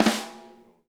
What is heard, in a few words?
FLAM, SNARE; Sonic Reality; Interactive Drum Kits